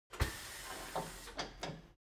construction garage tire changing machine hydraulic separator; unsteady